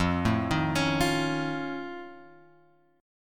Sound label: F Augmented 7th F, chord; F+7 Chord {1 0 1 X 2 1} arpeggiated